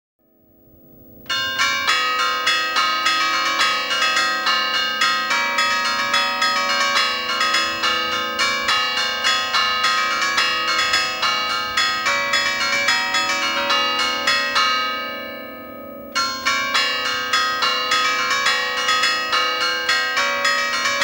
campane, Valzer per cinque campane; Santuario della Concezione di Albino

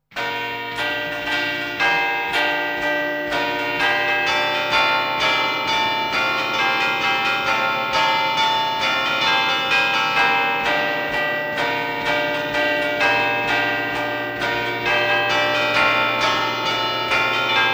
campane; Valzer per nove campane; Santuario della "Madonna della gamba" di Desenzano al Serio

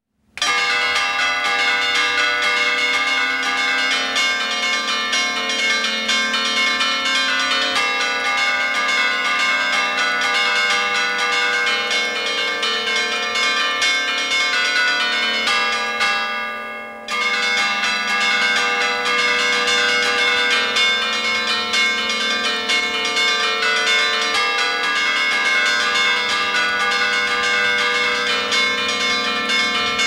campane, polca veloce per cinque campane, chiesa; chiesa di San Martino in Leffe; Tarcisio Beltrami

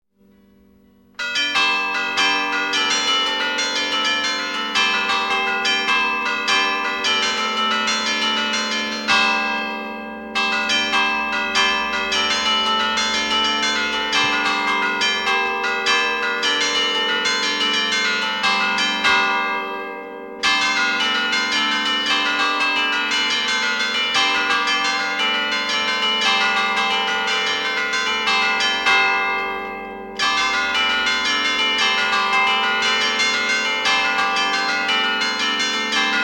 campane; musica in 6/8 per cinque campane; chiesa di San Martino in Leffe; Tarcisio Beltrami; Registrazione di Valter Biella

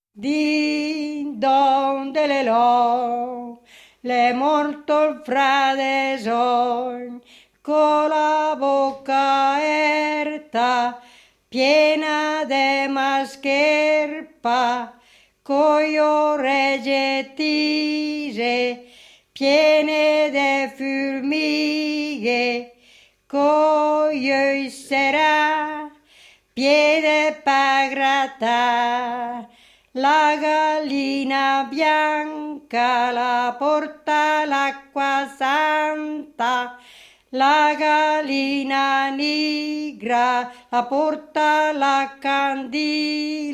canti; Dossena; "Din don delelon"; " El postiùn de Monsa"; Dossena 13 aprile 1995

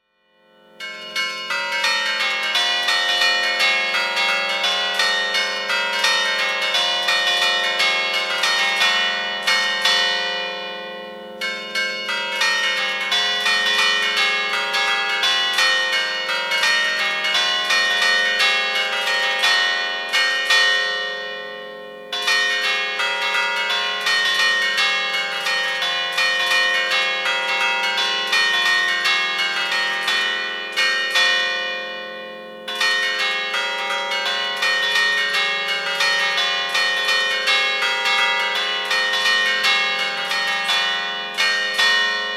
campane, mazurca per cinque campane; Bergamo; Giampietro Migliorini, di Bergamo; appresa da Agostino Casari

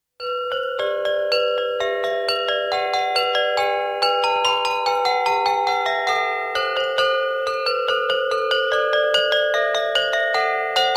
campanine; marcia lenta; Gandino

